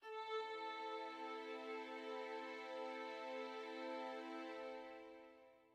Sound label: dark soft end; Quivr; PS4 Windows gamerip 2016